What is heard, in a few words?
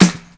airy snare drum sample f key, tuned drums